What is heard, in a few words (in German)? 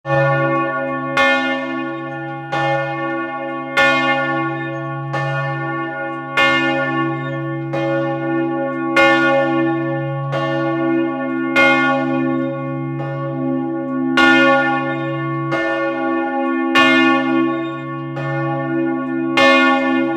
Glocke, Nikolaikirche Freiberg; Oswald Hilliger